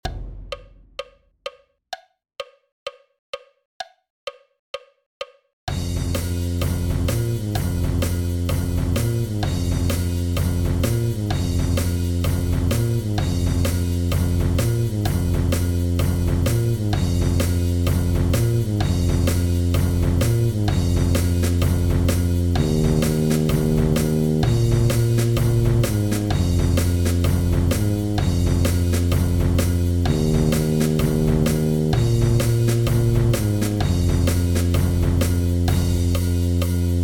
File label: rhythm